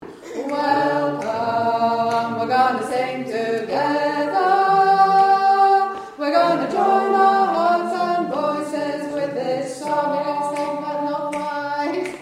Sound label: Welcome We're Gonna Sing Together Mid